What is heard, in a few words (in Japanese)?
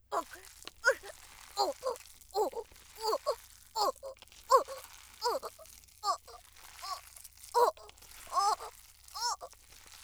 "お゛っ"